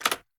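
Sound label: gear rattle weapon large; viper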